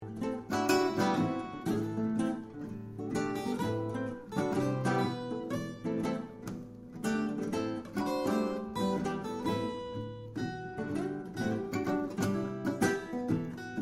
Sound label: Trio and the telephone